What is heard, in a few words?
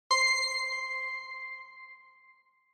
Single Accent Long